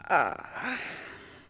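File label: Horse Archer Fidget Vox; Civ4 warlords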